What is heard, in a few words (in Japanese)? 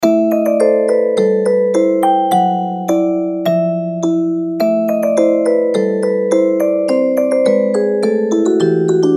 ミステリー オルゴール